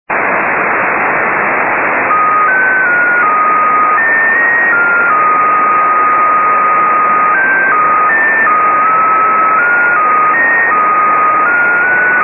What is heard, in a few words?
airplane; WSJT JT65C; 横浜市; 茅ヶ崎市; ドプラー音; 晴、気温11℃ 湿度50％; ANT: 45cm offset dish; 川崎反射(34km); 飛行機・機体反射